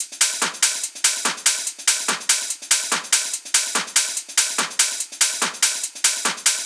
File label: Top Loop 144BPM, groove techno